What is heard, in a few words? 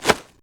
whistle; buckler